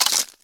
ice crack; Scrat

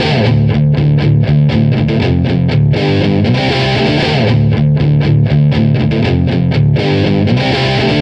Rock; Blockland

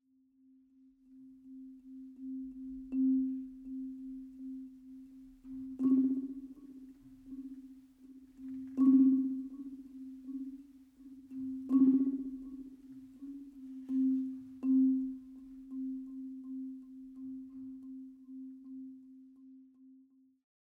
Marimba and Drums Mood; The Last of Us

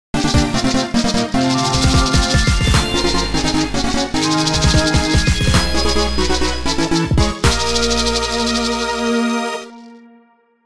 Maracas Obtained; Cadence of Hyrule

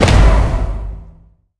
Sound Effect - Mission Appears; Aztez

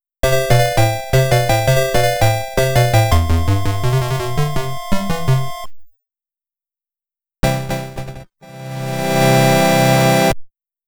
Unused Jingle 1, Unused Jingle 2

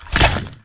carnivore plant

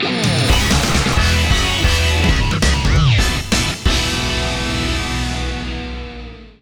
Result Win; the king of fighters survival city android

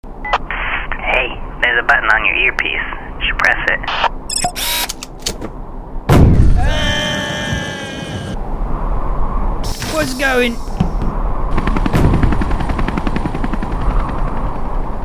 Force Gun; Infiltrating The Airship

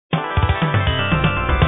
Sound Clear; action hero 3d wild dog

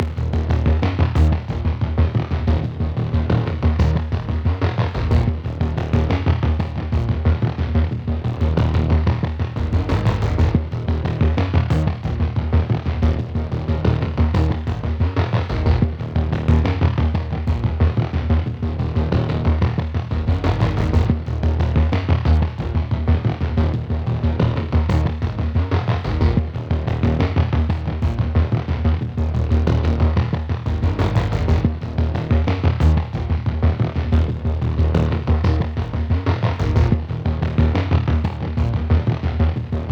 Synthwave 91bpm Bass; hot lava; ios macos windows; Splash

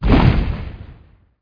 volcano lava Shot Hit; Kingdom Rush Frontiers

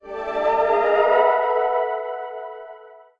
Map New Stage

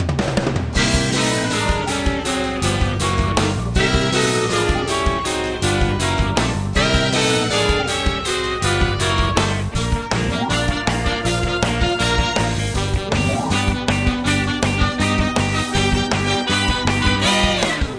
Music Minigame; cartoon network punch time explosion xl 2011 gamerip

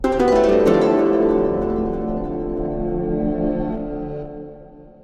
Battle End; Red Hot Ricochet

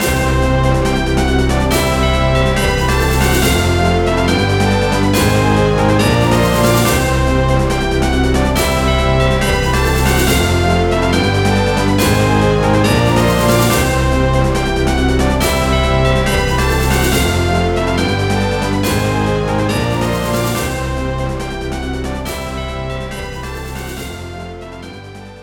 Result 1 (Fanfare); Mario Golf Toadstool Tour

